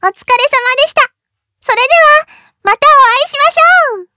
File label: applause; osu!; Retronix 2.0